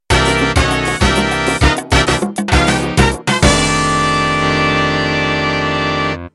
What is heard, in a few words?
Mambo End